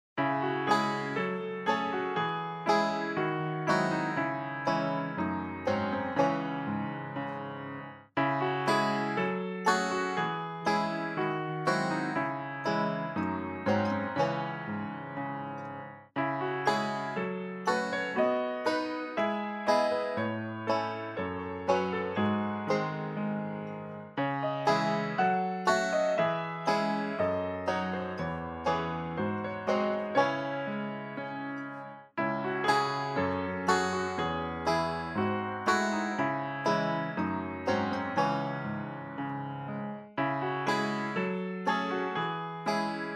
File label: Piano Improvisation